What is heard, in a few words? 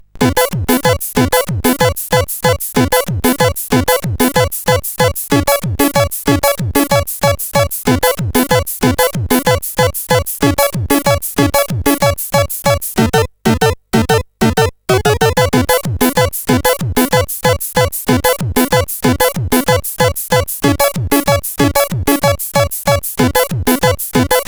West, CSG8580R5 SID; Creo